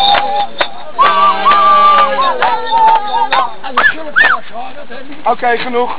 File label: Whistle